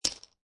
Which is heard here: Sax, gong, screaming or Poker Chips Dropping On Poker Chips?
Poker Chips Dropping On Poker Chips